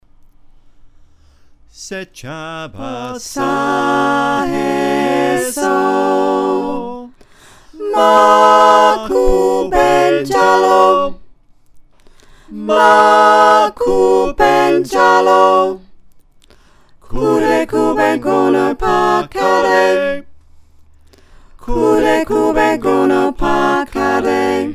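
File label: soprano alto tenor bass, choir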